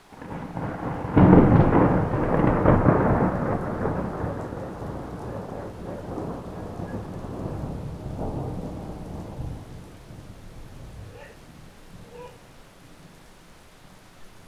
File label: Thunder